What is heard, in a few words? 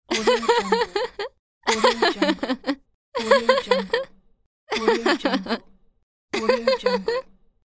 girl merry laughter